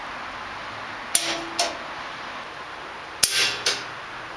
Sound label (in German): DHL klick klack